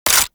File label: AK47 Low Clip; Warframe; Tenno